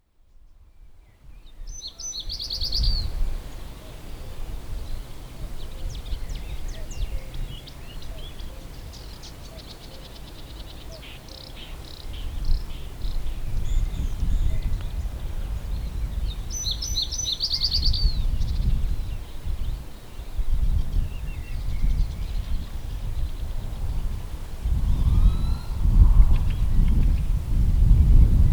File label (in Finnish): pajusirkku, linnut